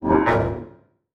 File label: Production Element Title Transition Bed